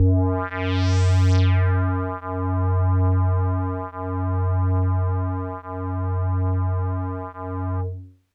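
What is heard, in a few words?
Hologram Kawaii F#2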